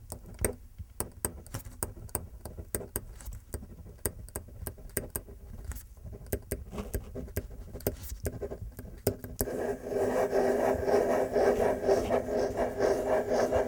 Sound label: Pen On Paper